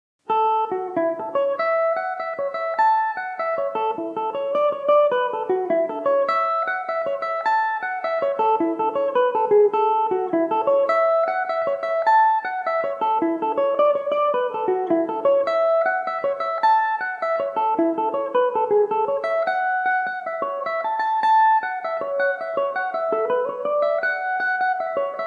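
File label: A major, Irish mandolin; biddy the bold wife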